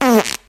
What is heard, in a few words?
fart short